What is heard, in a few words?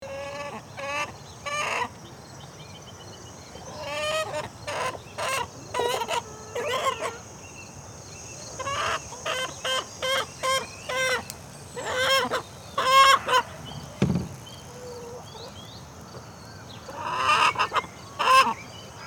Farmyard chickens ambience